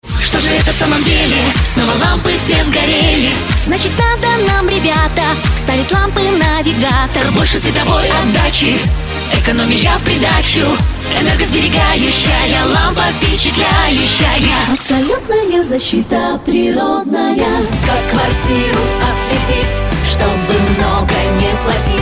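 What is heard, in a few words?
vocal, Russian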